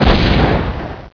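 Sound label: SMALL EXPLOSION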